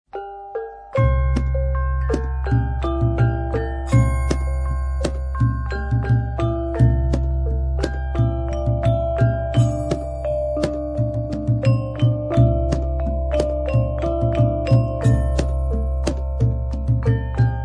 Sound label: Gamelan